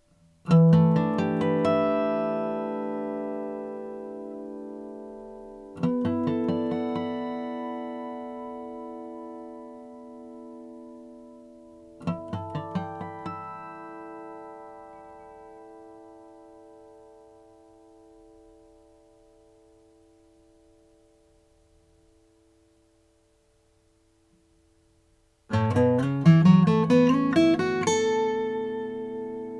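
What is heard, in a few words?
mcpherson mg 4 5 macassar ebony, guitars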